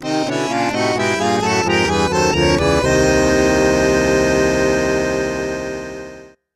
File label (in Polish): Akordeon